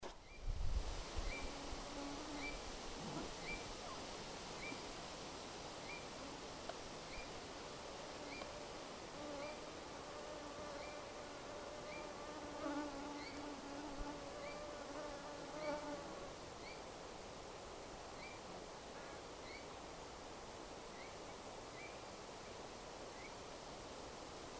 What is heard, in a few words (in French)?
bouvreuil pivoine, chant